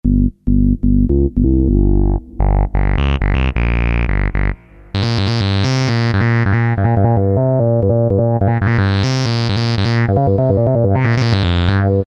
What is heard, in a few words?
3oh3 Saw MW, Alesis QS8; Factory Preset examples